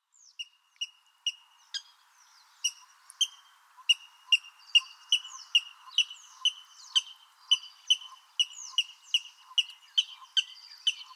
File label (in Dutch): Waterral Rallus aquaticus